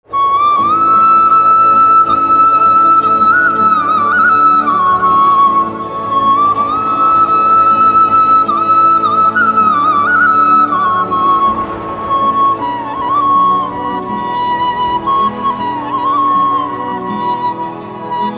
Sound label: Flute